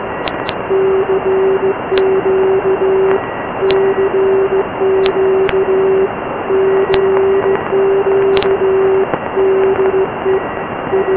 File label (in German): Beginn der Nachricht; SAQ Grimeton